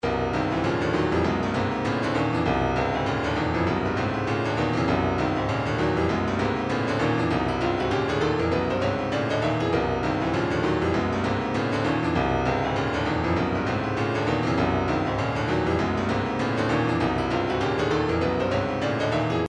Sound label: retro funk, midi; midget